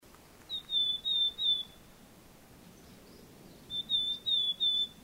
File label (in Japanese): kogara